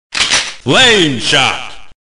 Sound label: Flame Shot